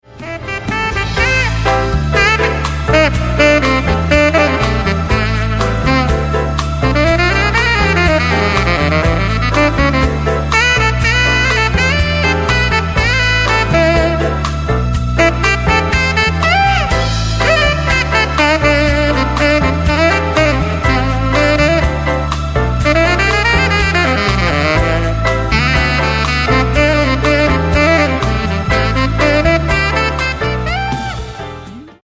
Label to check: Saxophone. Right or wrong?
Right